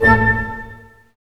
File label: HIT STAC, Staccato Oct; Roland L-CD702; Dynamic Orch